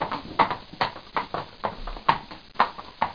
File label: Stairs Donkey Taxi Approach